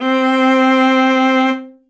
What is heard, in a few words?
Viola Solo Legato; Ableton; Orchestral Strings